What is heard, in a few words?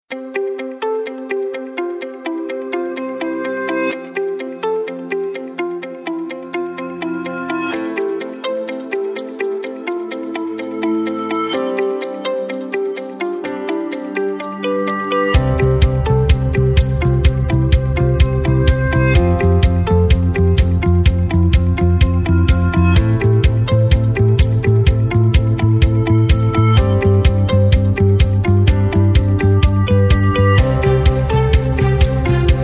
Pop Emo